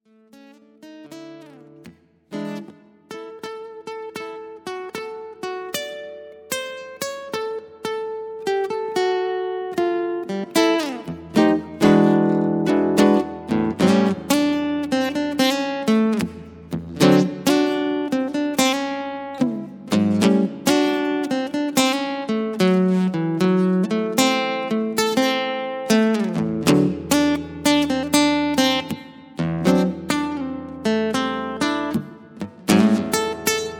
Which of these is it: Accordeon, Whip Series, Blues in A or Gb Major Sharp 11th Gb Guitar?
Blues in A